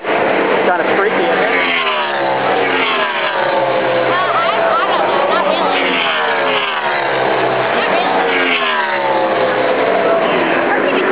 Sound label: nascar sounds